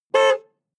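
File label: sports car exterior horn single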